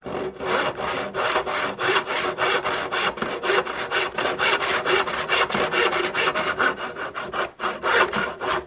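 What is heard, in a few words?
Tools Hand Saw Cutting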